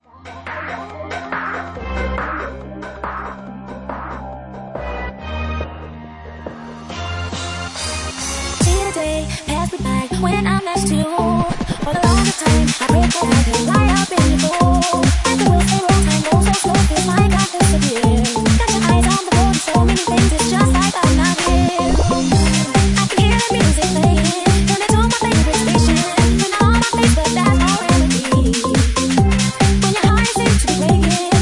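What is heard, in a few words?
Organ Mix, Bassline House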